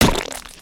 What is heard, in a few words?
Banana Gun impact